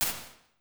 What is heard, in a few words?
Realistic Room, Impulse Responses, Small Spaces, Rooms; Apple